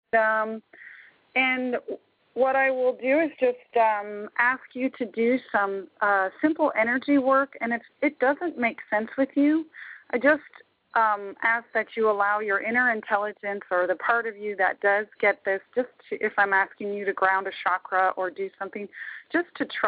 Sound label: earth ocean